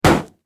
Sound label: Car Crash; teststrecke-game